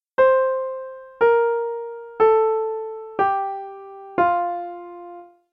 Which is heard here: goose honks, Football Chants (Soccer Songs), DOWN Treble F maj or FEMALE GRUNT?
DOWN Treble F maj